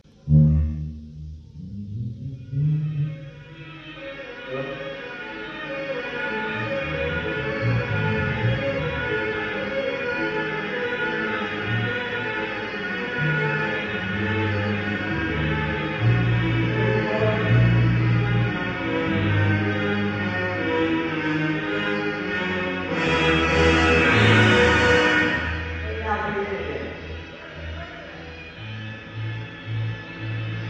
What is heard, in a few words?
Organ Guitar, Drum Check